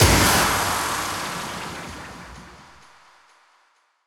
FX Downlifter

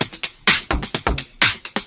Electro Loop 128BPM; Vengeance Samples

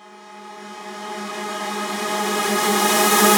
Tonal FX G#

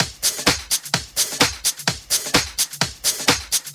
House Loop, 128 BPM; Vengeance Samples

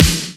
Snare; Vengeance Samples

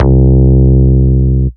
Ultimate Bass Root C; Vengeance Samples

